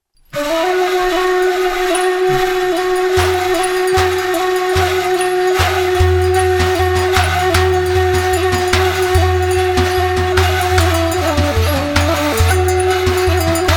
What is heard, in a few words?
Instrumental